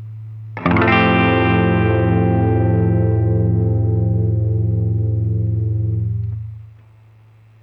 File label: Fadd9